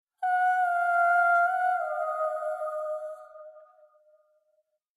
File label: Secret siren